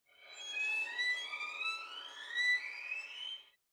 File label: Violin Slide Up